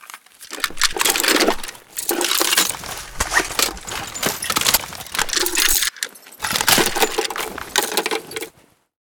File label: M249 reload, weapons